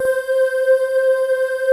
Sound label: LIGHT OOHS, pads; Pro-Rec - Synthsonic